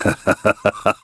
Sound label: LAUGH; BattleSNDS